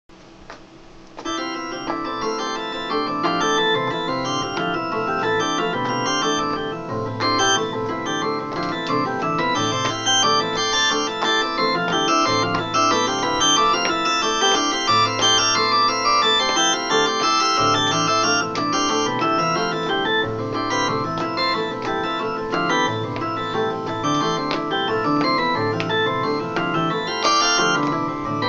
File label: organo, peaceful tempo